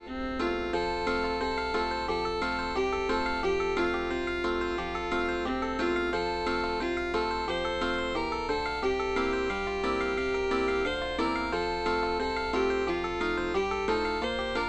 Apple Music Loop; camtasia

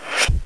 Swing; KeepoftheDemonLord

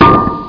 grapple; Quake; PainKeep; weapons

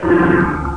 GROWL; duke nukem total meltdown

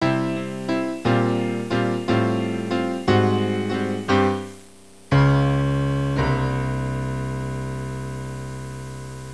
you lose music; Spades Deluxe 1.6

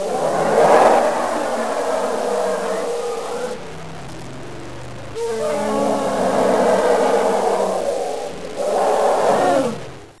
Roars; Dragonzord; Mighty Morphin Power Rangers Screensaver

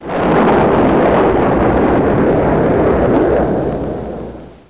jet

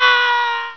YELL; The Incredible Demo; Tim

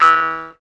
poing, cartoon; gfactory

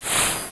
Shield; Starbound II

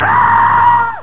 battle; CursedKingdoms

